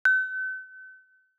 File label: Alert 3rd Party Haptic; i OS; iOS10; nano